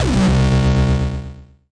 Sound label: nasty stormfront, Electro FX